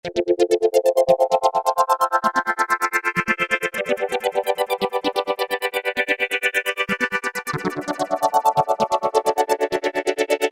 Sequence Pad, Korg microKORG; Factory Voices

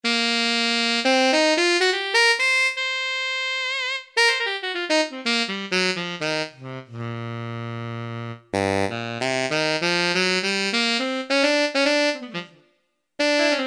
Tenor Sax; Yamaha A4000; Wind Instruments

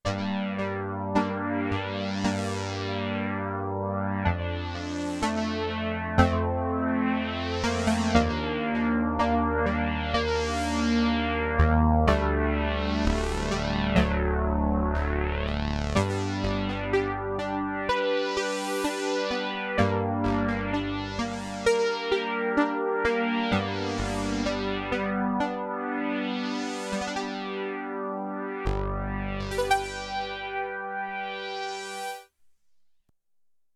SYNTH PIANO, SIEL DK 80; Factory

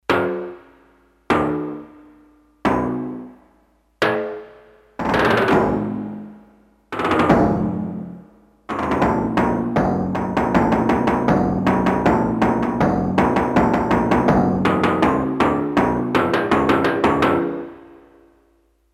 Metal Drum, Roland D-10; Factory Patches

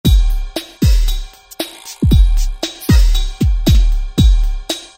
kit Wide Dance

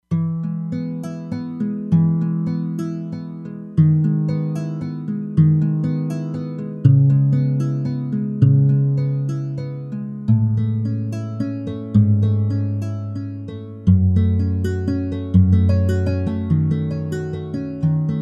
Nylon Guitar, E-mu Proteus-3; Factory patches

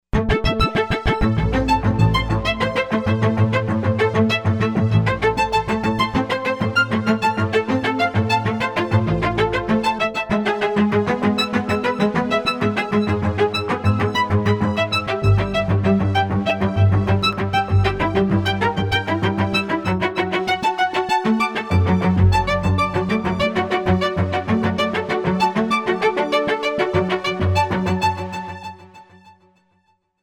Action Echo Marc Str

